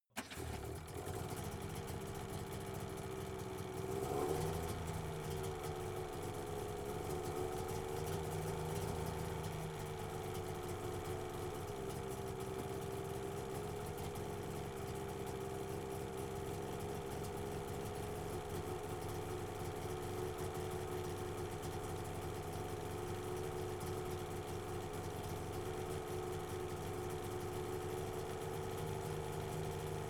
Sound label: car saab 92 startup idle engine